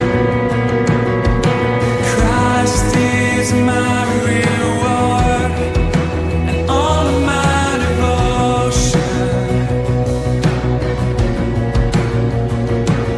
christian english song, christian gospel ringtones